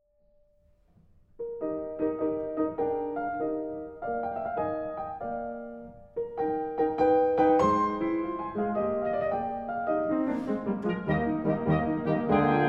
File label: beethoven quintet